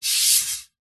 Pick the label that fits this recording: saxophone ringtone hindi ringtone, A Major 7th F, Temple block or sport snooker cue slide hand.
sport snooker cue slide hand